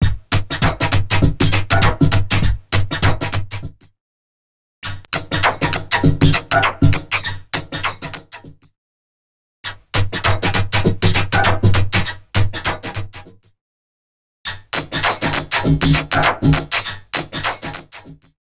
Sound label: Pipes 100bpm; X-Static Goldmine 5